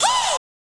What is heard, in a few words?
wooh